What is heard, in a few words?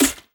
Snare, Dubstep Snares